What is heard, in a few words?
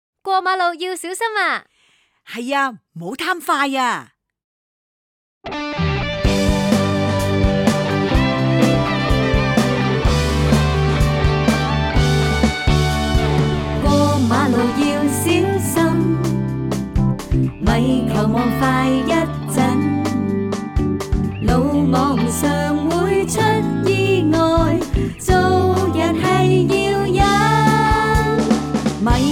rap mix with VO; Safety